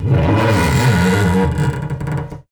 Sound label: piano FX scrape up down, Cartoon Film Effects